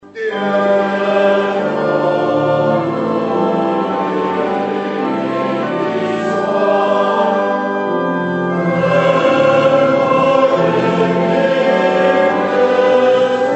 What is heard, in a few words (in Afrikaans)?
Gemeentesang; Psalm 139-1 verse 12